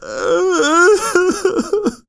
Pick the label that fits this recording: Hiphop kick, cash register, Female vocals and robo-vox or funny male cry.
funny male cry